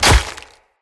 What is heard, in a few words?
Dagger Impact Flesh; Weapon Impacts; Daggers; Dendrit Dagger; Combat